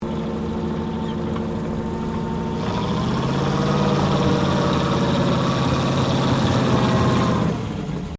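Tank Bren Gun Carrier Acceleration, British Universal Carrier; Trucks; Vehicles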